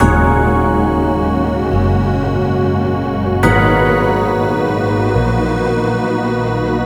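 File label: Melody Loop D# minor 140BPM, Dubstep; Murk; Ghosthack; Dubstep Sounds 2025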